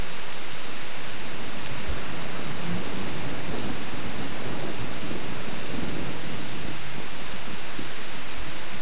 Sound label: Storm Fish Multisound amplified thunderstorm